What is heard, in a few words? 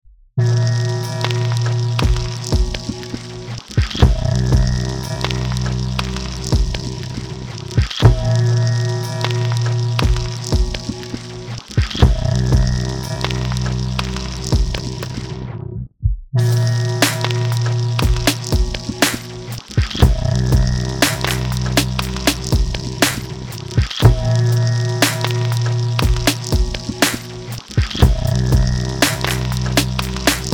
beat bleep; RadiAzioni